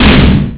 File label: ShieldHit; Shockwave Assault; Shockwave Demo